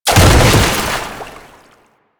Bodyfall Water; Warframe; Lasrian; Lasrian Soldiers; Foley; Body Falls

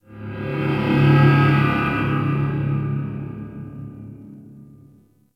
Galleon Low Ship Rand Amb; Warframe; Ambience; Grineer Galleon; Galleon Misc

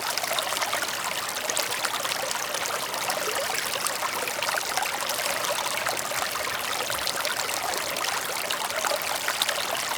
Waterfall Stream Loop; ambience; props; Eidolon; Warframe; Eidolon Plains

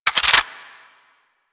Flame Thrower Reload; Warframe; Weapons

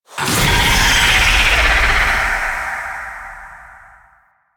Vocal Shriek B; Enemies; Warframe; The New War; Archon; Nira

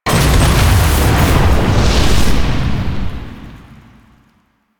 Lasrian APC Explosion Quest Stinger; Enemies; Warframe

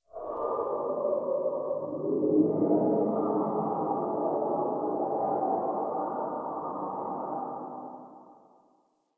Exterior Distant Calls Scared, Ambience

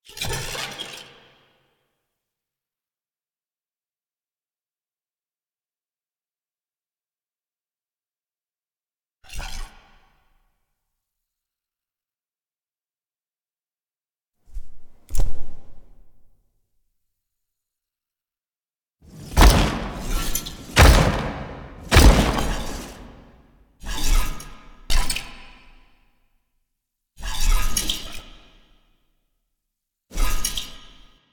Throne Room Foley; Warframe; The New War; Intro Throne Room

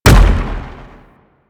Biped Step, Enemies; Warframe; Man In The Wall

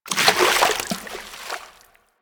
Fish Jump Splash; Ambience; Warframe; Eidolon Plains

